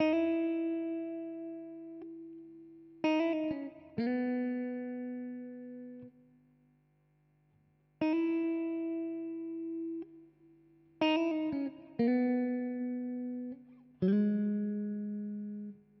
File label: Guitar Loop 120BPM C#m, chords & melodies; 99Sounds; Monosounds; Music Loops; Instrument Loops; Guitars